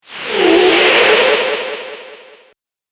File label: Magical Air